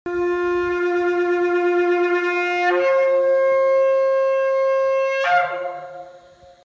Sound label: shofar